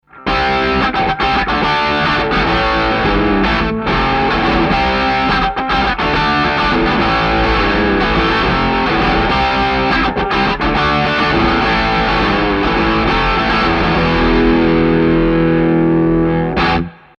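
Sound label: Electrodyne501 Melodium42B Electric Guitar Chords; ssl six ch channel strip 500 series preamp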